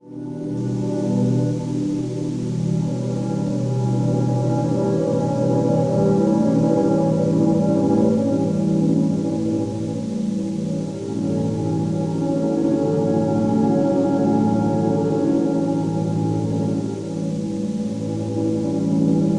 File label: granular abstract ambient atmosphere; sonniss gdc